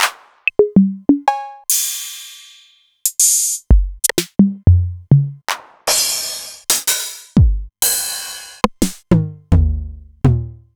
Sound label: Novation Drumstation; DrumstationV1